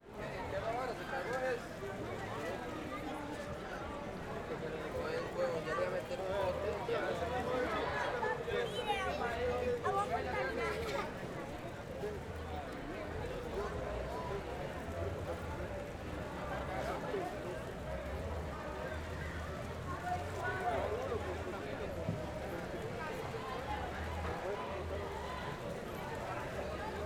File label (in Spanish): Frente a la fuente del reloj